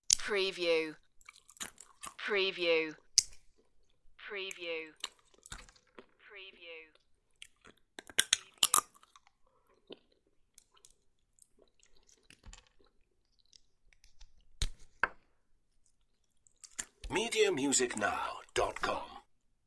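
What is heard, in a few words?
Eating Pist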